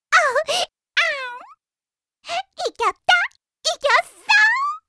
get point; Pochi

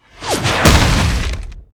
meteo

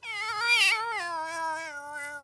voice Player Down; Poteko

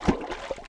Fishing stick in water

